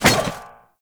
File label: Zipline Brake Foley Contact; Gadgets; Parkour; Warframe